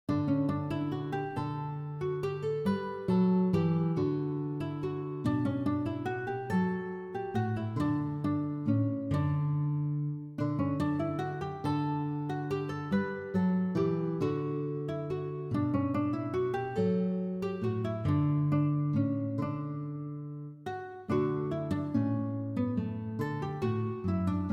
Lute; adastra 2018

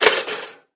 Toast Popping Up

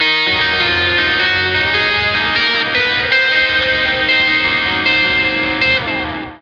Lake Hi Gtr E flat 9; Torchlight III; PS4

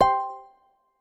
Match; Ellen's Garden Restoration; android

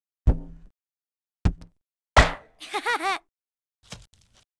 Sfx Toy; Charlotte's Web: Wilbur and Friends

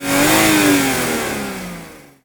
Rev; blastmorph pinball; xbox series xs

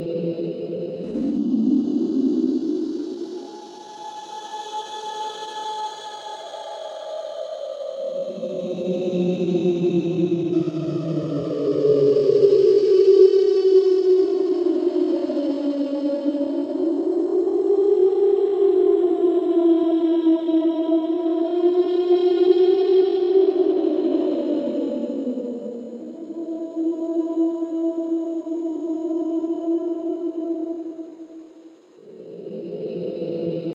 ambience slow deep

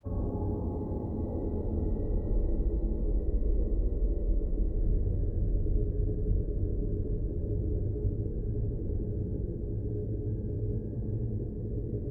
Ambience Deep

